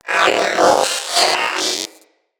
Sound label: Zanuka Companion Vocal Pant; Pets